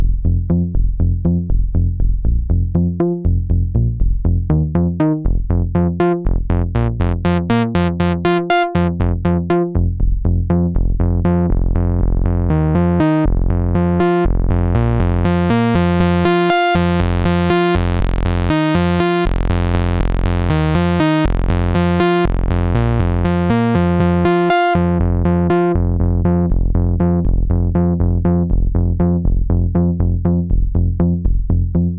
bass saw

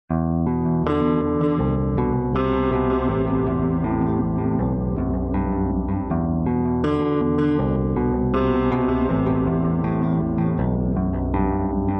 guitar

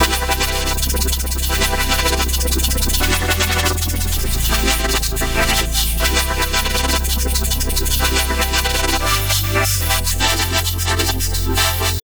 supersaw; wavefield

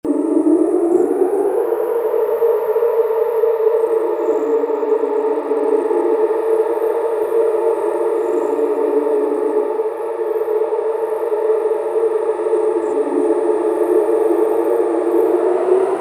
synth halide

